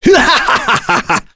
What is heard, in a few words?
Vox Happy; Kain; kingsraid; voices heroes